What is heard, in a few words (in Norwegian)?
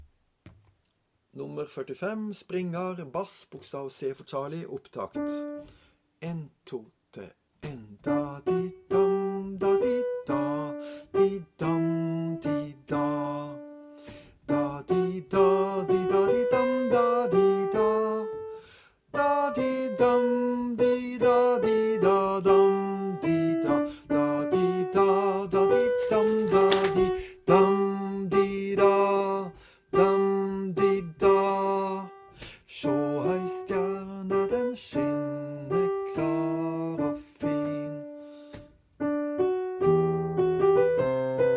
Springar Bass; lydfiler for korister